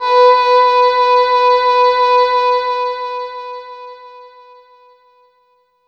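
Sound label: HORN delay; East West - Phat & Phunky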